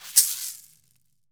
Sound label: caxixi; AKAI S-Series CD-ROM Sound Library VOL-5